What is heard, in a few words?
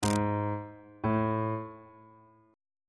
a flat 1st intervals